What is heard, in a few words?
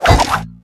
kiting whip heavy